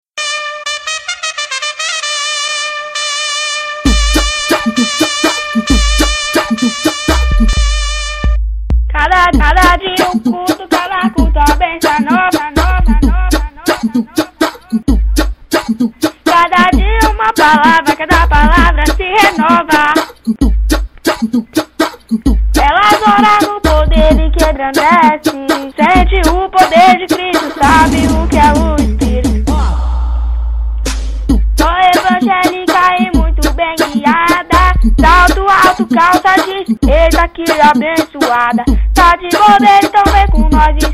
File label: gospel funk